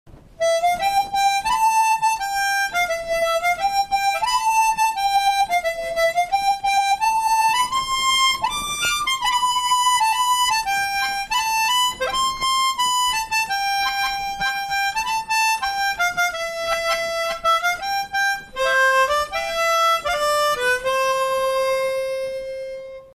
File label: Harmonica song